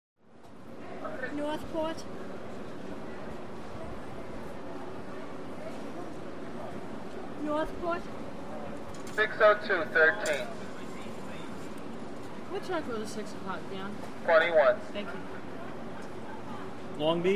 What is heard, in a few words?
Train Station, Clean Dialogue Variety Of People, Ethnic Mix. Info Man Through Speaker. Distant Walla, Hum.